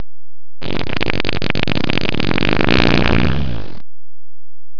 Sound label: squidgy